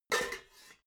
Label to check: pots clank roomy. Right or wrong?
Right